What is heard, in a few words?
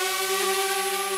drone active, Engine; Vehicles